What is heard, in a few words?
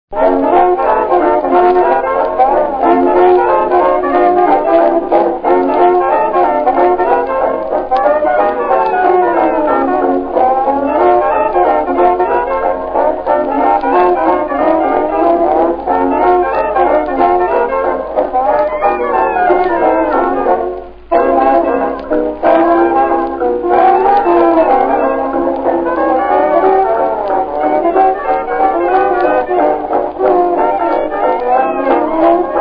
Jazz blues; dixie